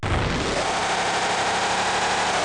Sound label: SIREN; Rubber Chicken Software Sample Bank